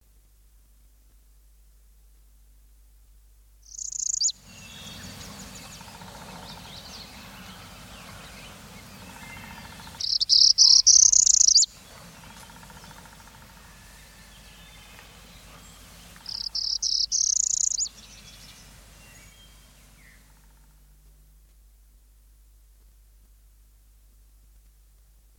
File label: northern parula warbler, songbirds